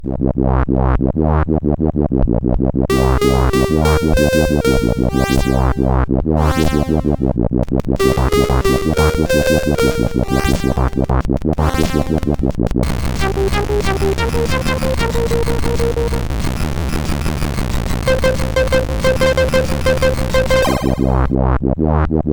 Tune sid CSG8580R5

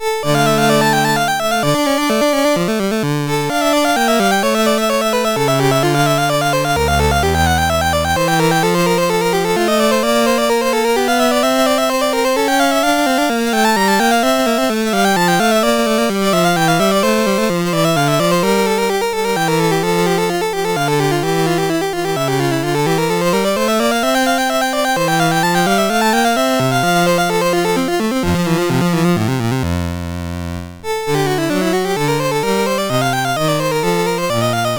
Courante D Minor Sonata, MOS6581; Graun

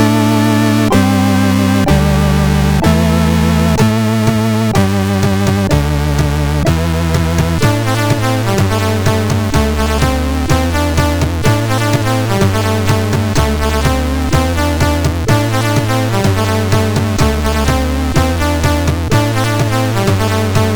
Euroblues, sid MOS6581R4

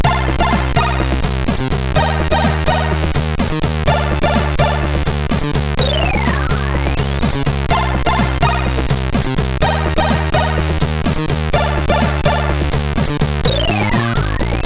Shadow Sun, MOS6581R3; hvsc

